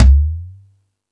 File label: Thundadome, E-MU Pro-Cussion